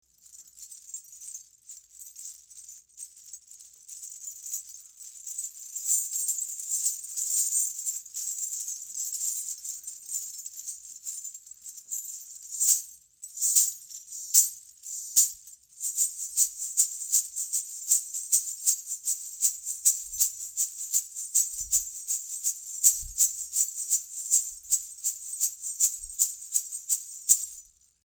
Bells single bundle of pieces, Bells Percussion Instruments; Afroton